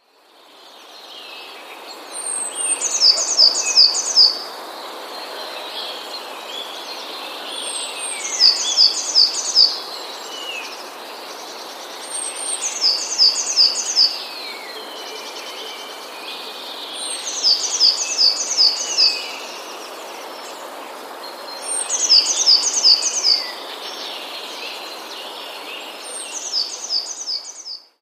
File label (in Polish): spiew